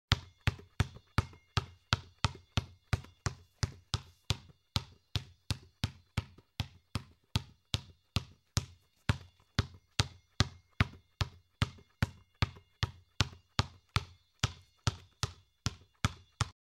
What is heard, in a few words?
Basketball Dribble